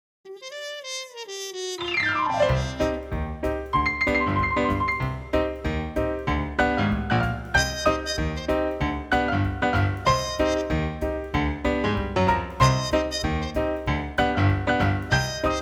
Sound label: Piano Nuptial